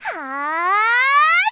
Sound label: attack; Eternal Return; Tia; Voice Line; KOR